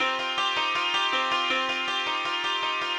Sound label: ethno, 160, C